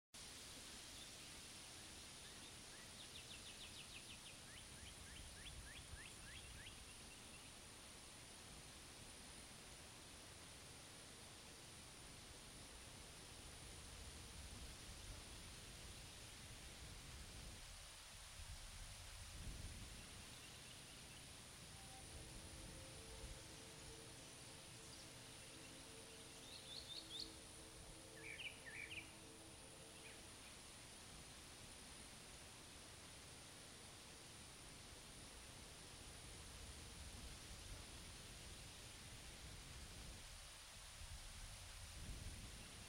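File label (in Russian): легкий ветерок воздух и немного птиц вдали; Звук легкого ветра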